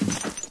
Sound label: player tile; Source Engine; dod